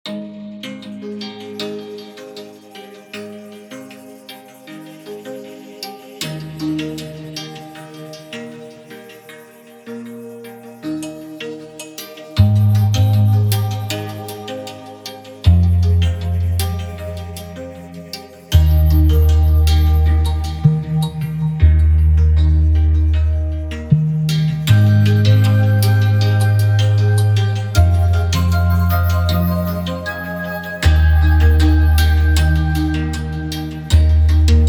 Omnisphere Music from a Stapler

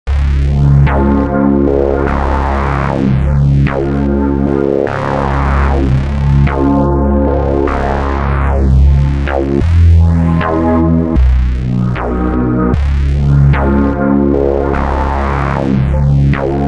Omnisphere Complex Filters

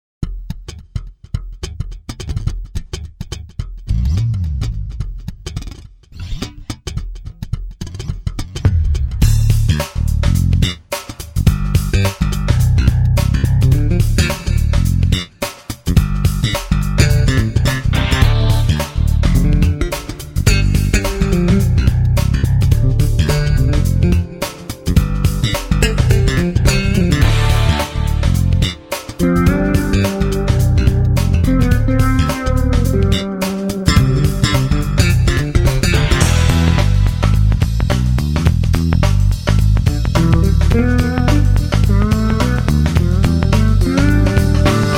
Bass Multisamples; Bass Legends

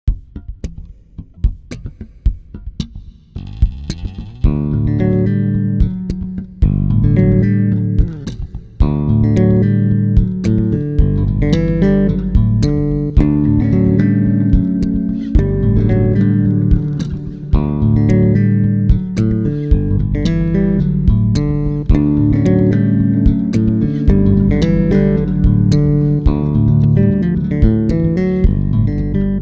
Naked Bass; Trilian

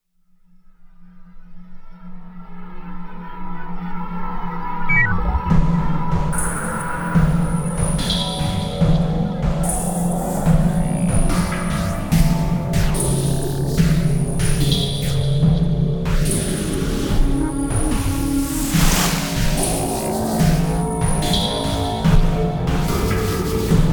noise play

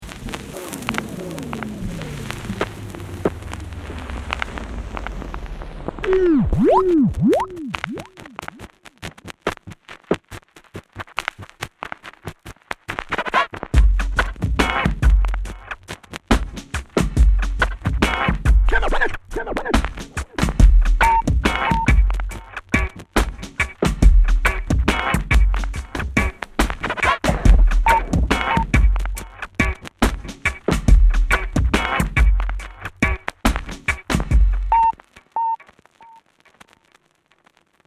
Vinyl Dub; Stylus RMX

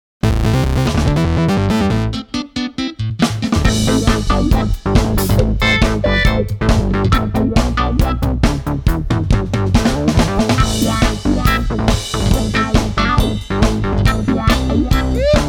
Frantic Disco Jam; Trilian

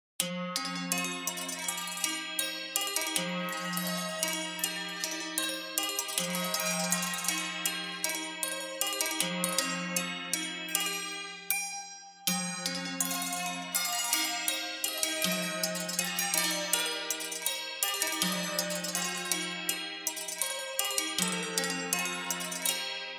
Fairys Pluck Harp; Omnisphere

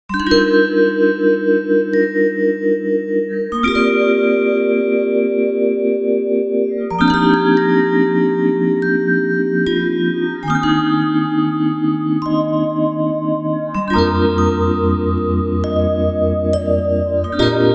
School Mallet; Omnisphere

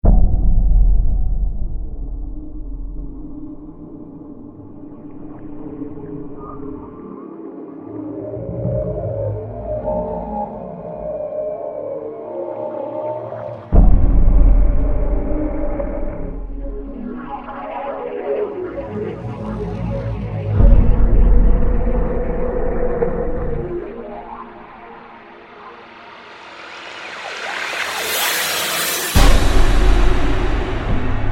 Distorted Reality

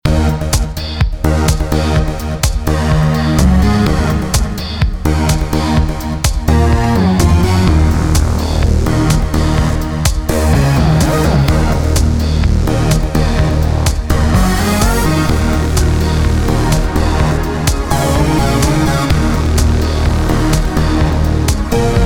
Synth; Trilogy

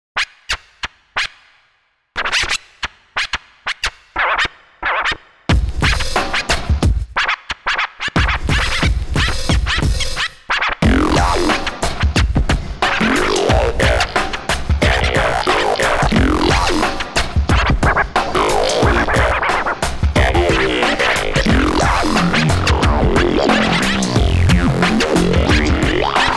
scratch mosphere; Stylus RMX

